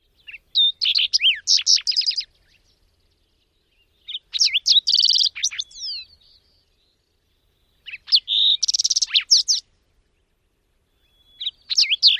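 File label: songs, bird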